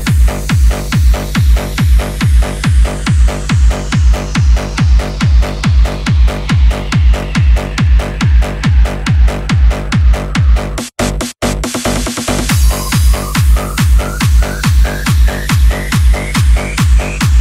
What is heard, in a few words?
Ringtone For Vivo